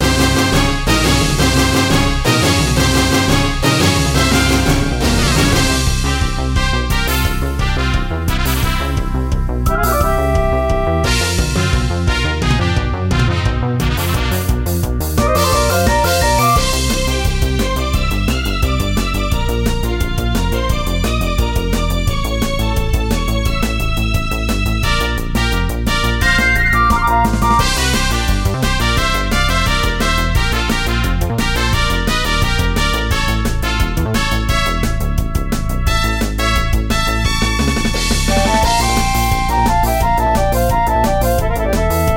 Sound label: Gym Leader non looping no timpani; Pokemon BW